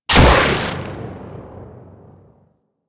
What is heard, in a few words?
Bow Shot, Weapons; Warframe; Corpus